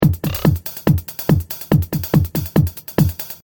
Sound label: Techno Trance Drum Loop 142 Bpm; Royalty Free Drum Loops; LYN117 pack of techno-trance 142 BPM